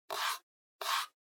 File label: Trackpad Swipe